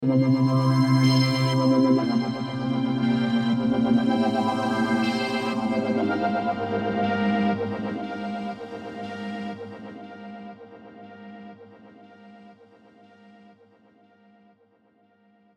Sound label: Strings Pad